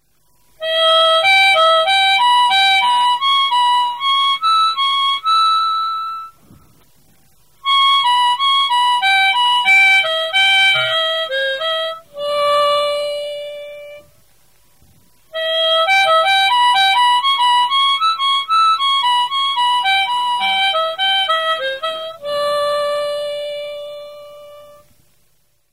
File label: sliding